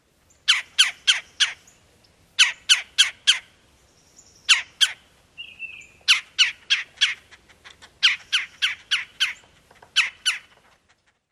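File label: REBEWO chi calls, red-bellied woodpecker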